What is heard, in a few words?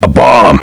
a bomb